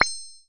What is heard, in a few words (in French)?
arc